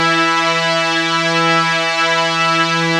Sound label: OMNIVERB, ARP Synths, Analog Odyssey